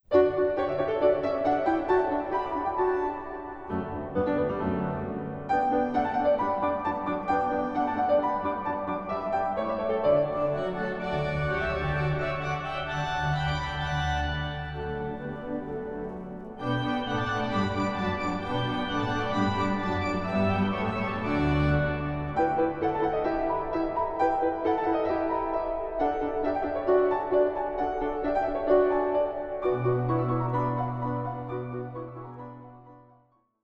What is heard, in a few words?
Keyboard concerto Rondo